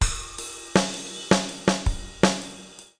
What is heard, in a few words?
Drums